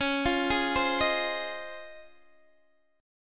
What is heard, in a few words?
Db Major 9th, Guitar, DADGAD